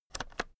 keyboard external shortcut short

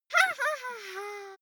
child laugh fake haha

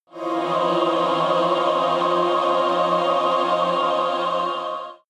evil choir chord dissonant

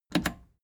plastic button scratch smooth click disable fast

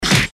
fist hit

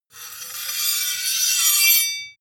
sword blade grind long

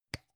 gum plastic box open soft

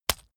leather impact mud hard

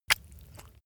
leather impact mud medium

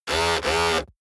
servo buzz short

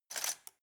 cutlery plastic drawer grab

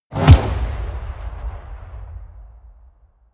burst

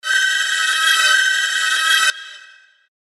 hf sweep

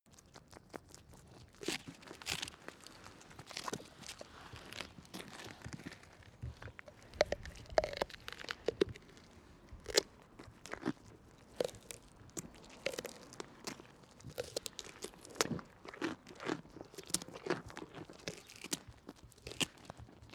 goat eat carrot

